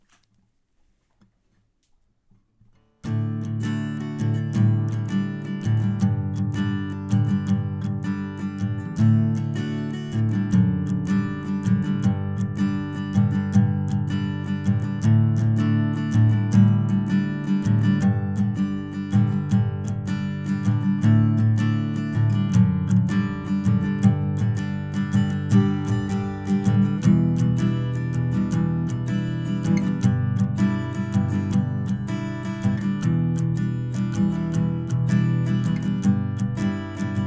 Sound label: verse strum